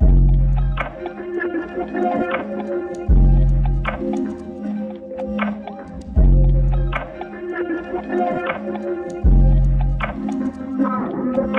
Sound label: 156 BPM F Min, Melody; Cymatics; 2022 Melody Collection Platinum Expansion